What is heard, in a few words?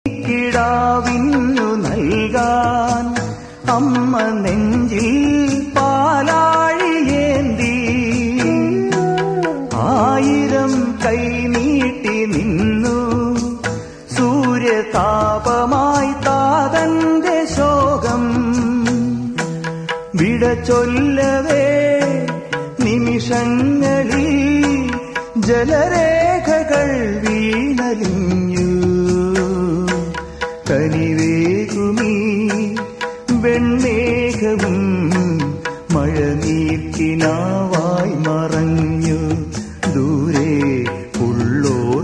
malayalam evergreen songs; mohanlal